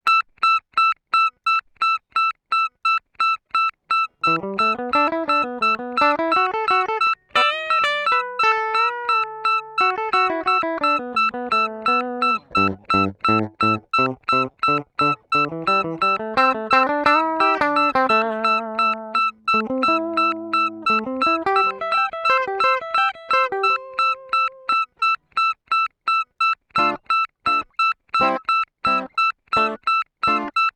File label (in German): tb audio kong lucky loop metronom loop